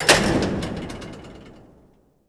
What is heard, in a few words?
open; svencoop; underrain